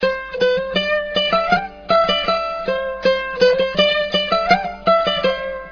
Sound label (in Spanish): bandolim, instrumentos tradicionales ibericos; tradiberia